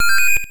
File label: Coin; Black Tiger